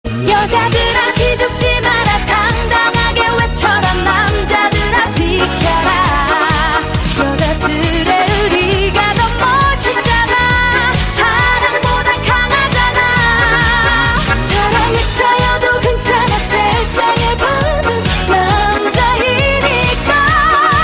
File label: fast rocking female song tone ringtone; nokia ringtones